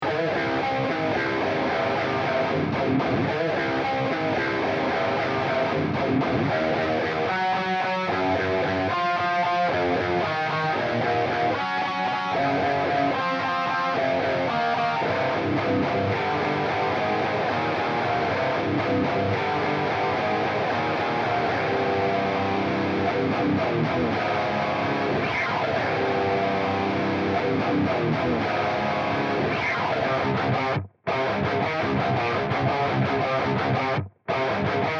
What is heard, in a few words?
Anvil Bridge Modern Metal